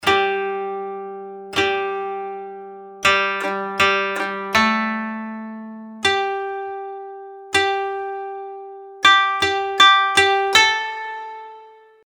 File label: koto